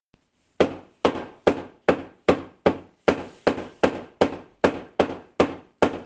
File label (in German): Hammer